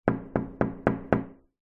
household window person knocking on window

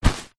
foley bag nylon pe grab hand; zapsplat

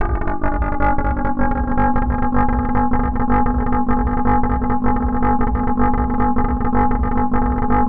Broken Club Synth C; martyn stonehouse

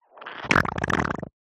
human baby poop wet squelch

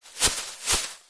plastic bag thin empty shaking open up; foley

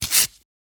Book Paper Single Page Rip

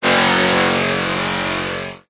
sound design robotic burst buzz raw; zapsplat

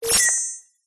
science fiction computer glitch tone

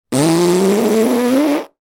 human blow raspberry

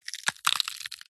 horror bone break snap crush; zapsplat

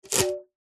sport tennis racket strings strum fingers; zapsplat

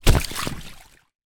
inflatable rubber ring land in water hard; zapsplat

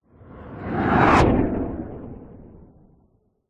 science fiction space vehicle whoosh past

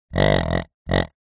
pig grunt designed; zapsplat

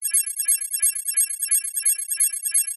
science fiction computer scanning tone

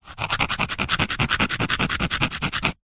stone small scrapes fast on rock or brick; zapsplat; foley

